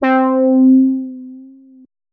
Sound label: Juno Synth Bass; OP-Z